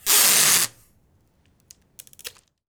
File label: TAPE, STATIONERY; AKAI S-Series CD-ROM Sound Library VOL-6